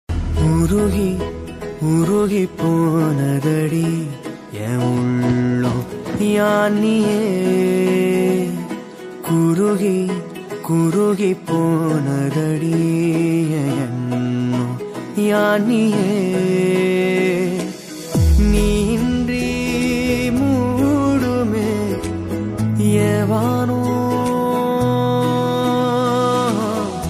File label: love bgm ringtone south bgm ringtone tamil ringtone telugu ringtone